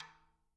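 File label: stick rim, Peter Erskine Living Drums; JZ XTOM 1; Sampleheads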